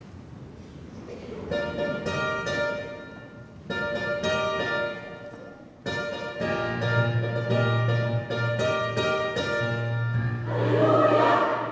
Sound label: Praises from every nation, gospelchor